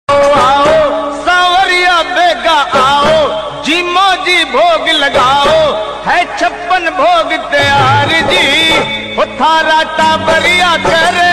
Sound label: radha krishna flute ringtone, bhakti ringtone